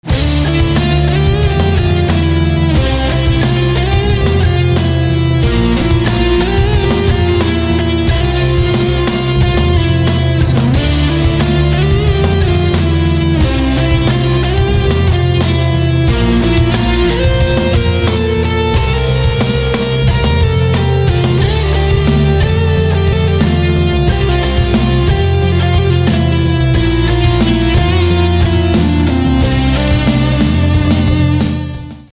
Electric Guitar

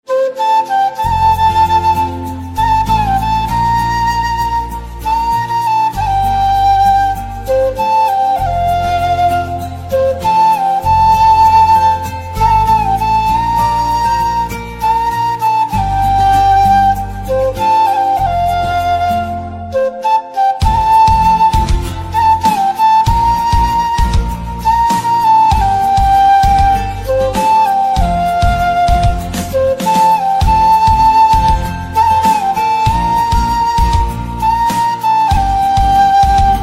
Flute, instrumental ringtones

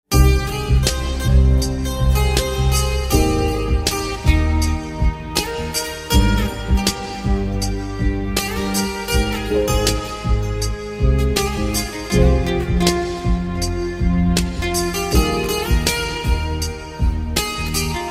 Guitar

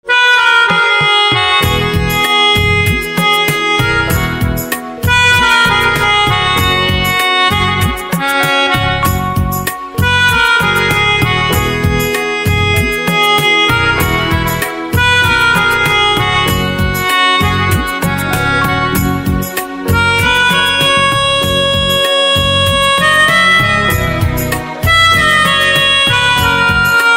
Pianica, instrumental ringtone

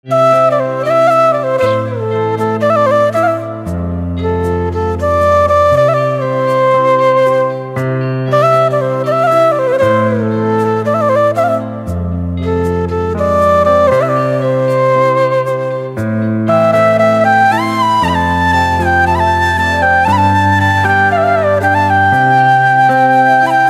Flute Instrumental Ringtone

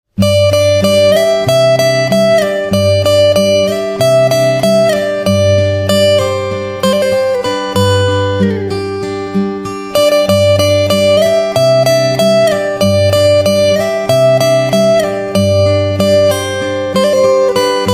Guitar

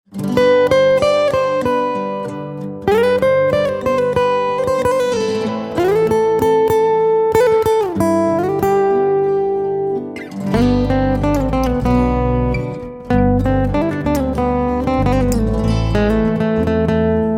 Guitar

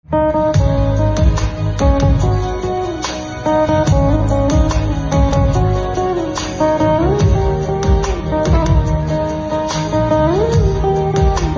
Guitar, instrumental ringtones

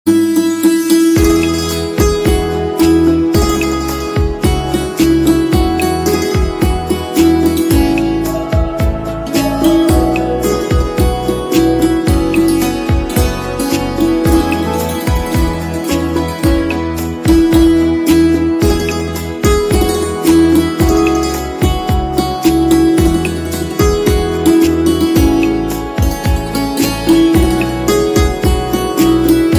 Santoor

